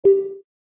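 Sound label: call recording sound, end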